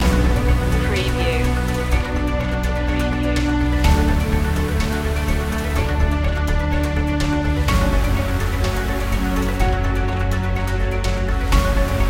machine land loop

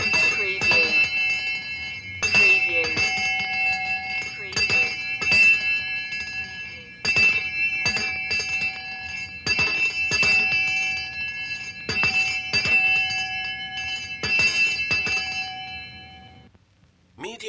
Town Criers Bell Vintage

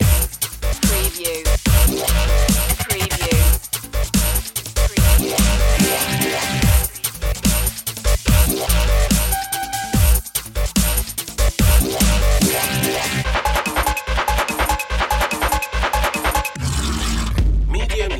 machinery loop

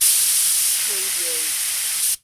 Paint Spray Gun Short

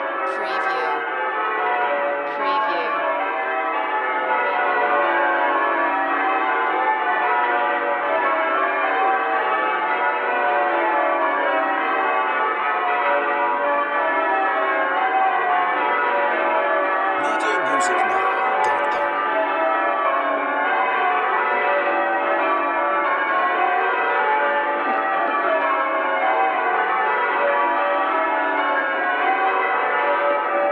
Church Bells Westminister Abbey

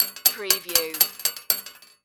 Bullet Shell Drop Machine Gun Multiple Metal

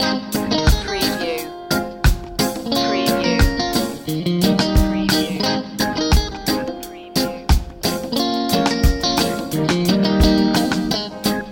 soul of reggae loop

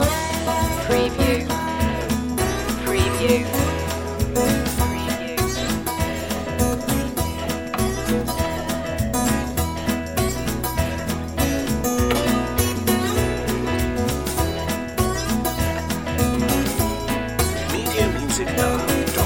countryslide loop

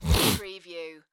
Sniff; beanstalk audio

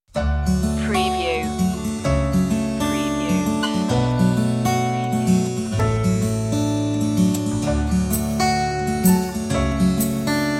no strings

